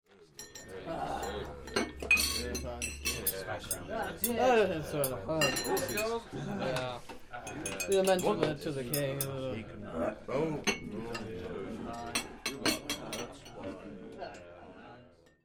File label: men at supper